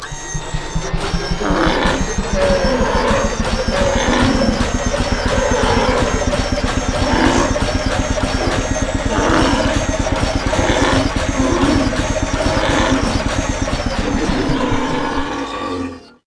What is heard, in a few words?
mechanical Bull Stream